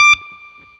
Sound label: BLU POP, Guitar & Bass